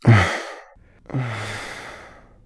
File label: eat cake male